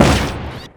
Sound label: ministrider fire; grayworker